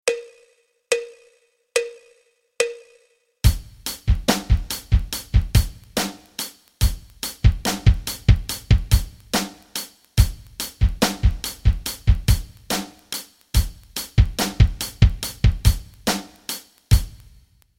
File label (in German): rock rhythmen